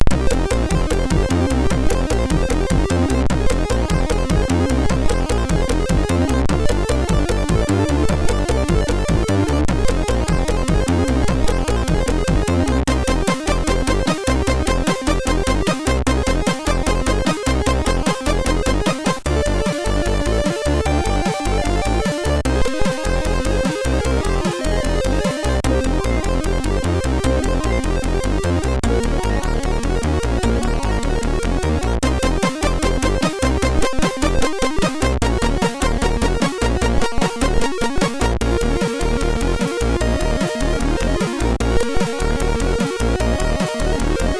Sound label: MOS6581R3, sid; Ksin 01